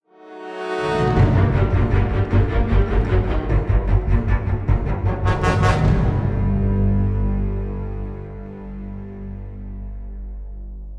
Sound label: String; assassin s creed unity gamerip 2014